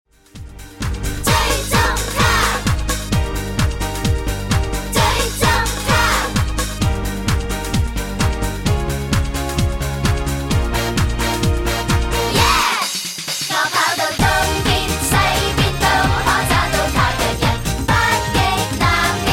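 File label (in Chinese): Karaoke, 兒童詩歌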